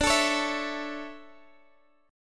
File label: D Major 7th Suspended 2nd; DM7sus2 strummed